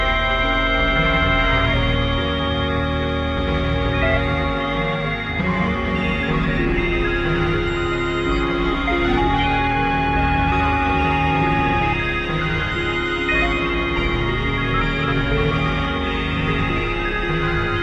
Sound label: midi